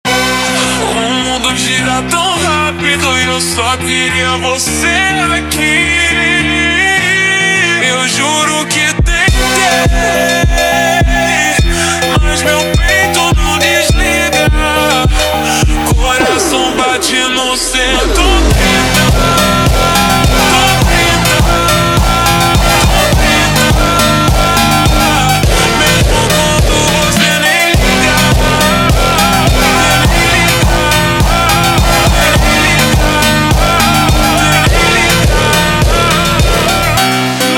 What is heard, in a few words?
Montagem super Slowed